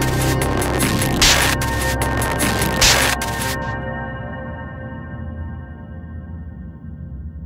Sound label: outro music; slender; barney